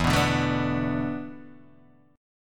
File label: E 7th Suspended 4th, Guitar, DADGAD